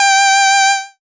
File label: syn g, Dance Synths, Acid Bubble